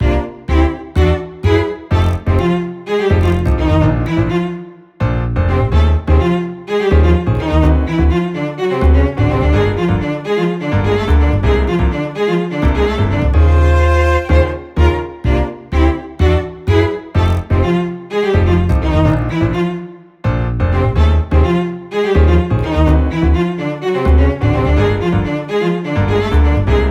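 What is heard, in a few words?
BGM Salon Result Draw Western; clubhouse games 51 worldwide classics 2020 switch gamerip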